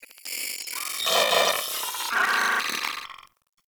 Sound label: Crp Arachnoid Micro Vocal Idle; Warframe